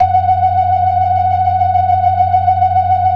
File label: 42 BLOOD L 1, ORGAN; Keith Emerson Signature Series